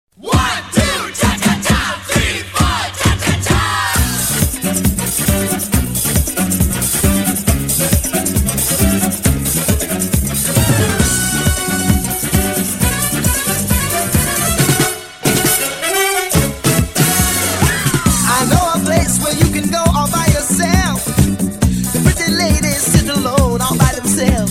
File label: cha cha; Dance-Jazz/Tap Tracks